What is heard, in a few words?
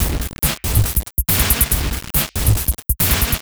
Noise Beat; Drums & Drones